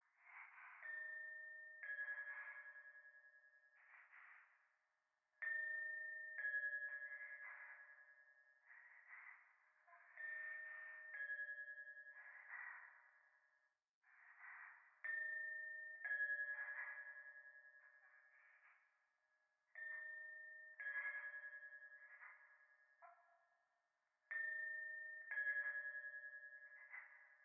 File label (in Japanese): 教会 左耳密着吐息 神社